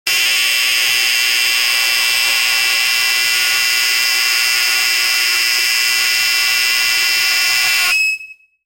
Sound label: loud basketball game buzz